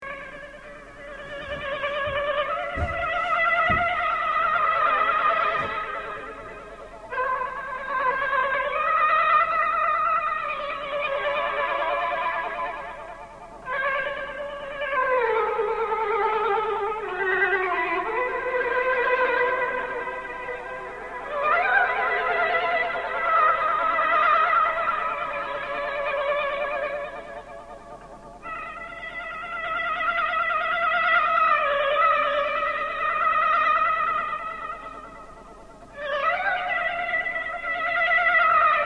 warbly bollywood, nepal

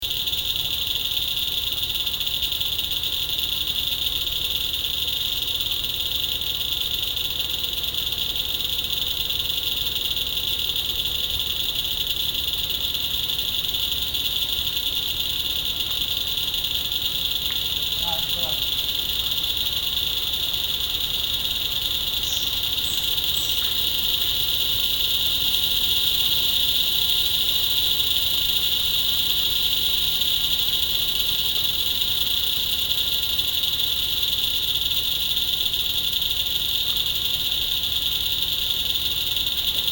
Mangrove Cicadas, fiji

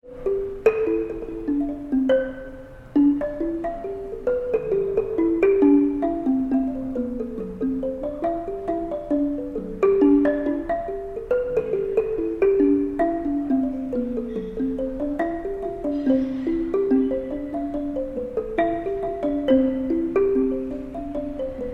marimba

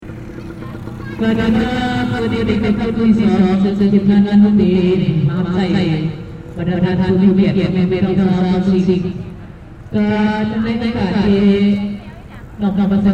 fullmoon

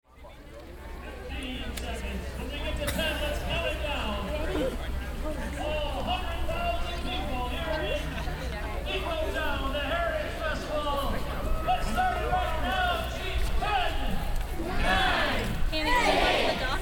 Ypsilanti MI Rubber Duck Race

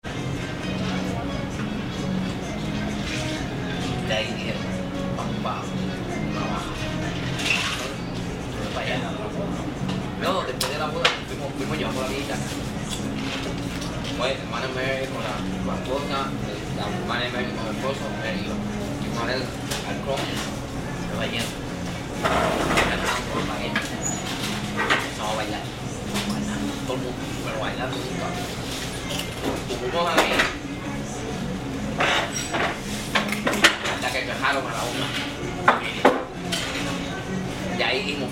St Louis Cuban Deli

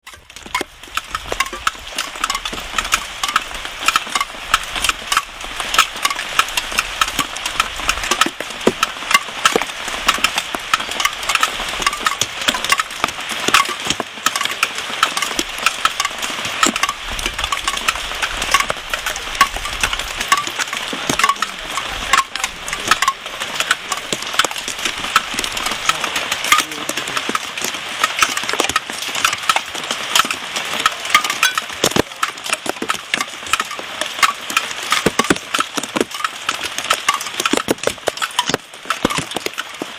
Koenji Tokyo Rain On Kirin Cans

